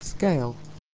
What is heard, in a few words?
scale, Shopping